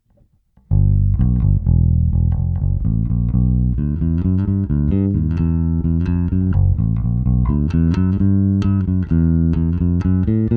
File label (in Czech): Fender American Deluxe Jazz Bass V, korekce rovně, roundwound strunami Fender 82505M; basa; Téma: Jay Turser Precision bass JTB-400C-BK-A-U